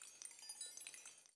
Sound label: Desert Rain; gamerip